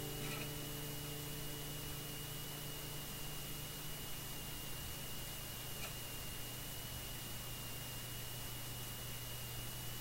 Acoustic Session

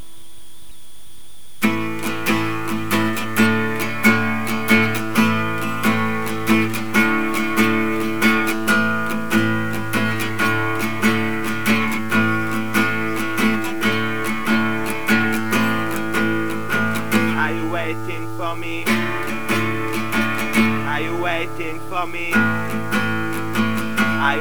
Tuba; Are You Waiting For Me

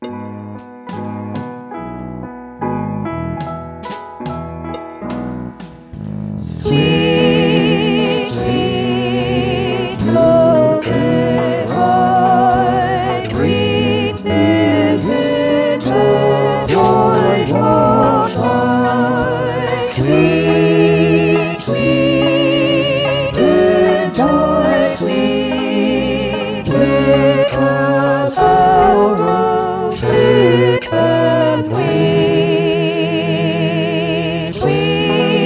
Swing; Chicken and mushroom pie

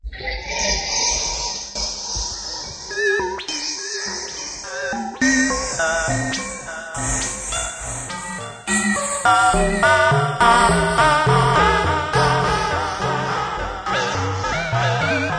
Electronica noise; Hypnose SGDG a Letebrho

